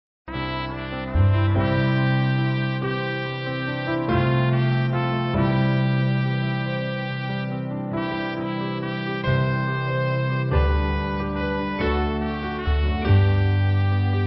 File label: Blessed Assurance, Band